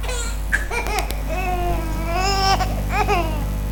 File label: laugh